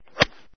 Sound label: Golf Game Sounds